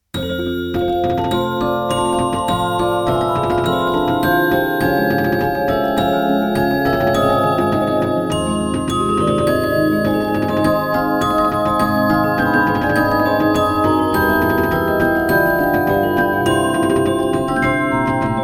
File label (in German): Spieldosen